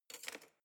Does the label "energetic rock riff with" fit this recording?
No